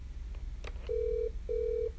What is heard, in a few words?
Other Non-Staff, Poly VVX ring tones